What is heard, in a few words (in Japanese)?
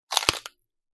骨が折れる音